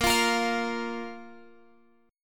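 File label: A sharp 5th chord, Guitar, Open G tuning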